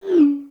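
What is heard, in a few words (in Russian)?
new message sound in app on iphone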